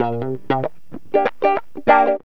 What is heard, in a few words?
GTR, CHANK; Zero-G; Funky Elements